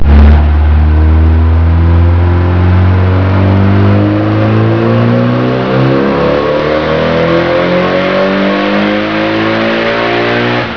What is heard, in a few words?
dyno run